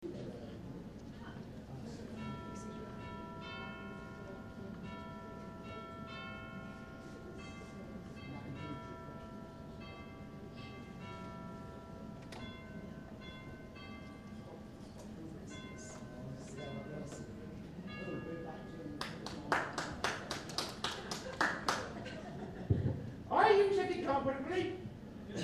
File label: chimes